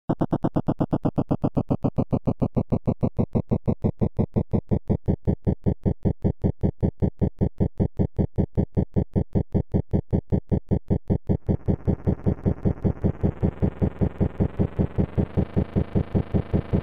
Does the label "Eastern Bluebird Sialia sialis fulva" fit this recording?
No